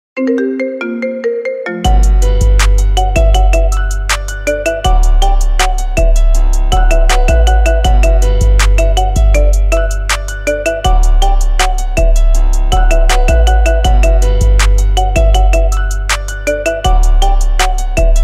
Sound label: Marimba